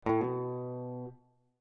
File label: hammer on, guitar